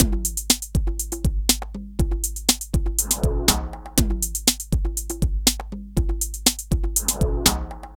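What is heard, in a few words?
drum loops, right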